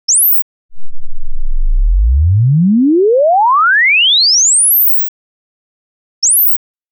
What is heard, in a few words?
Measurement Sweep 0 to 24000 0 dBFS; 48k; acoustic ref FL; Audyssey One; A1 Evo Nexus; Timing reference file